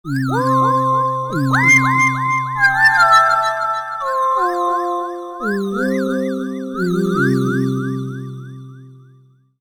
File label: FX Lavalamp; microq